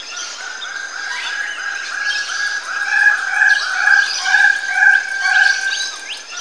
monkeys, primates; Zero-G Ambient 1

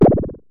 Synare Filter; Star Instruments Synare 3

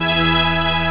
DIDI, SYNTHESIZER, DIGI WAVE

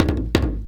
BIG TAMA HIT; Best Service; World Colours

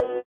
sitar, Indiatek; Spectrasonics; Metamorphosis

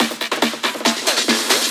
Fills, Loops 140 BPM

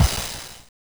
Crash Cymbal; Sonic 3; M8; Genesis

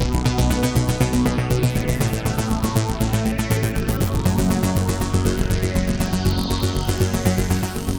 Arp, Keyboards

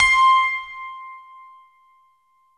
JV E GRAND, JV-1080, PIANO

